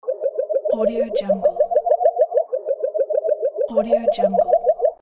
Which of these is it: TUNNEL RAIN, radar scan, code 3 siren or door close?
radar scan